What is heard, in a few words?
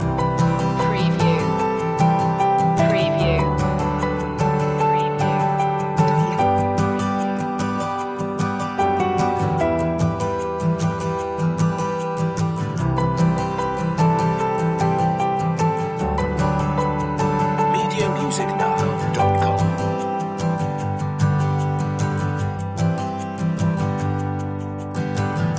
loop